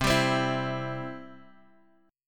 C Major, Guitar, Drop D; down; chord shape

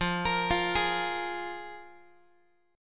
F Minor Double Flat 5th, F, Guitar; Fmbb5 arpeggiated; x,13,13,15,12,x; 4A,4E,5A,5D,5G#,6C#

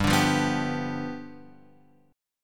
G 7th G down Guitar Open F; G7 strummed; JGuitar

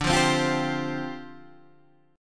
D Minor 11th D down, Dm11 chord; Dm11 strummed; JGuitar

